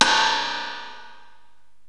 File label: 505 Drums, Roland TR-505